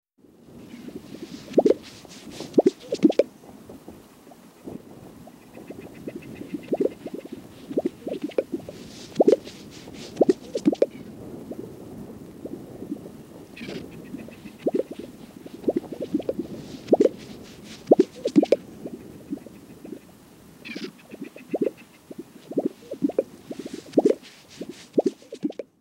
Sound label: Gunnison Sage Grouse Display Calls